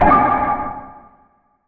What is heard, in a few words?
ITEM DICE